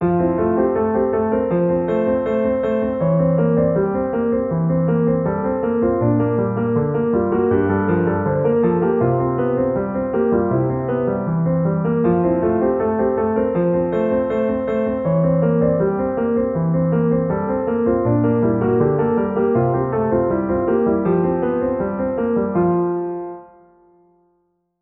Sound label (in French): Rondo pour tous les instruments; Versione G. Denini